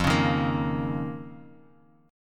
F 7th Suspended 4th Sharp 5th F down Guitar Drop D